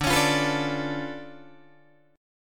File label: D Minor Major 13th, down, Guitar, DADGAD